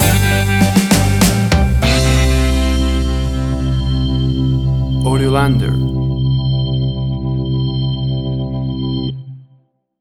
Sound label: 50s School Prom Slowdance Stinger